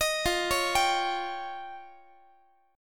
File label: F 7th Suspended 2nd Sharp 5th, Guitar; F7sus2#5 arpeggiated